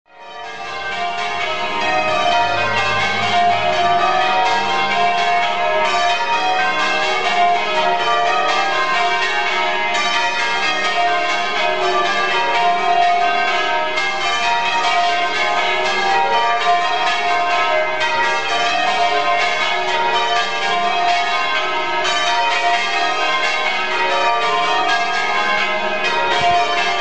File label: stedman; probus